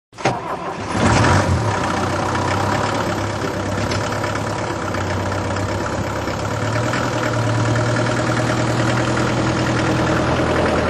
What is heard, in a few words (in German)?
Lkw